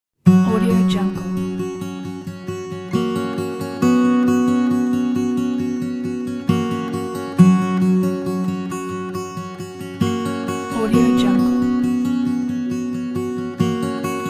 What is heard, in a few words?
ambient atmospheric acoustic guitar